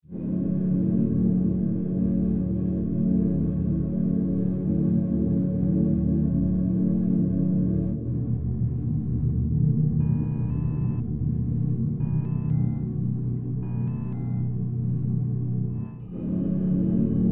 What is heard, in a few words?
thevillage; roomeyes; 1 MISSING FRIEND